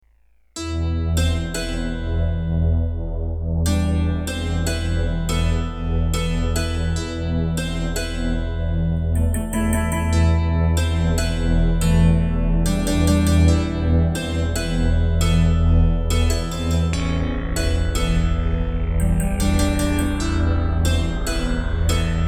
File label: Octapad